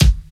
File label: snappy kick drum sound a key; kick drum samples